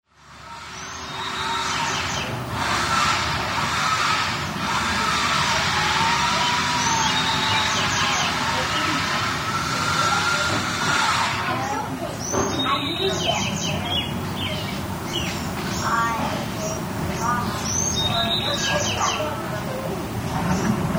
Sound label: Ambience Seattle